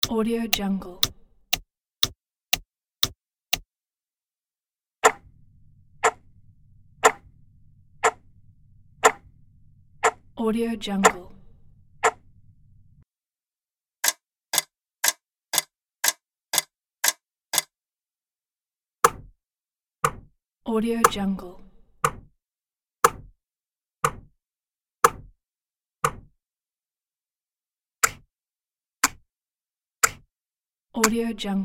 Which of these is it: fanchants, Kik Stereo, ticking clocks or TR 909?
ticking clocks